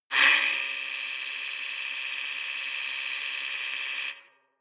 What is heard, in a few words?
buzz